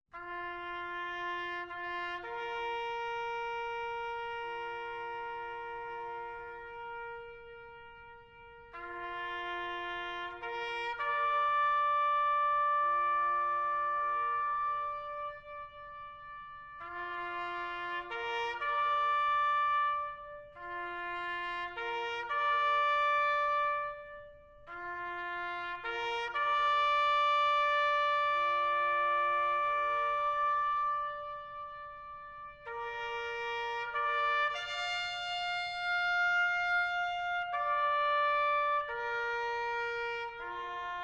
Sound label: Echo Taps